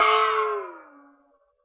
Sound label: BOX FX; Univers Sons - Raricussions